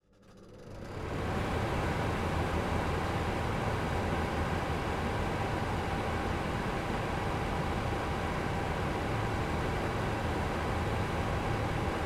household oven cooker hood extractor fan on operate off